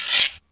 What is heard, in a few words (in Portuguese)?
CRECEL; East West - Global Sounds; AFR.BRAZ